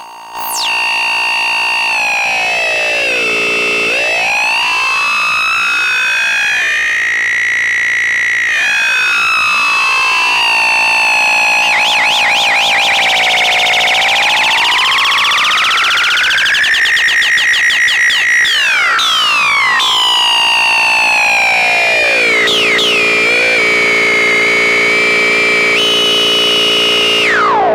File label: Synth Sweep